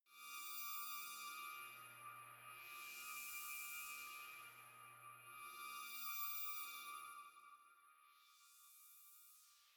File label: space ringing space wind danger; atmosphaeren umwelt; weltraum